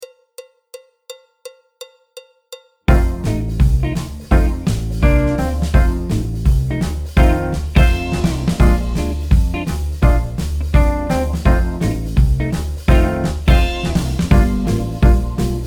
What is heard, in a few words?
Unicycle Blues BT, alto, flute, trombone, piano; Jazz Syllabus Level 1